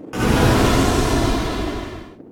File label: crash